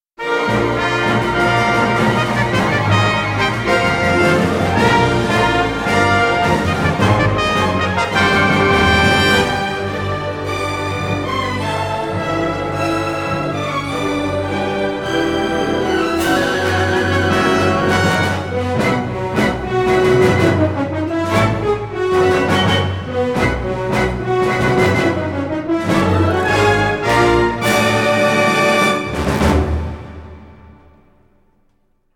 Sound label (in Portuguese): impacto